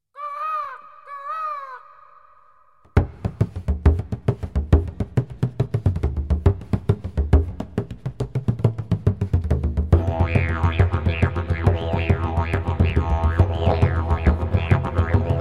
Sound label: Jiggery Didj